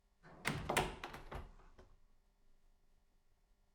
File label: door sound